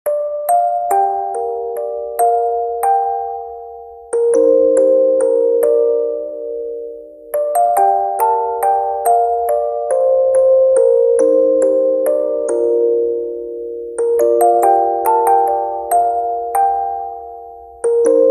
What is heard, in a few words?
Music Box; Furious 7